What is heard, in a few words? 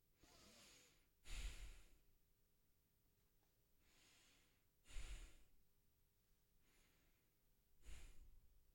Sigh